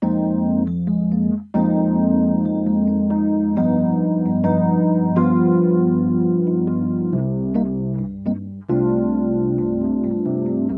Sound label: Electric Piano BOSS Super Chorus CE1